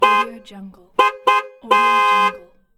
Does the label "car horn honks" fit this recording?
Yes